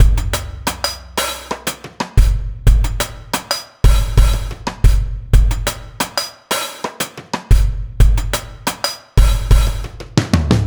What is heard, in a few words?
Beat, Drums, Eclectic Electronica